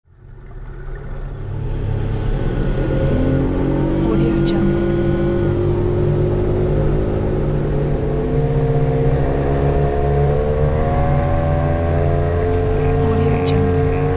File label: motor boat